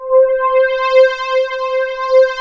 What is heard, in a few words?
JUNO STR; USB Soundscan vol.49; Analog Fantasies; AKAI